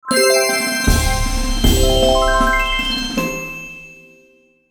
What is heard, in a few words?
Logo Bells Drums; Sonic Logo Sound Effects; Production Element Imaging Element Accent Transition